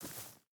footstep burlap grass walk; Rust

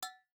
salvaged icepick hand tap; Rust

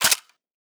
bolt shut; Rust

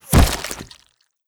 bullet impact cloth flesh; Rust

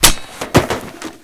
Inf Weapons Foley 3rd; Red Orchestra 41-45

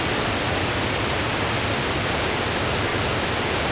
waterfall loop; World of Tanks Blitz; ambient canal

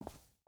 footstep hide concrete walk; Rust

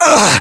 death, voiceovers; english

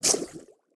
bullet flyby underwater; Rust

